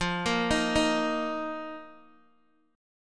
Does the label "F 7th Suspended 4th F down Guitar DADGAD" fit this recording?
Yes